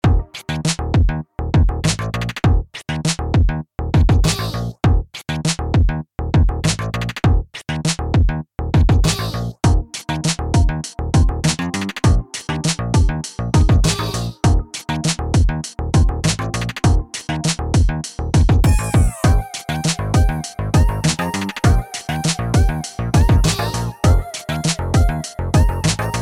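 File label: instrumental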